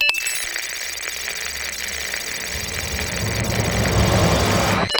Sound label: quest turn in decode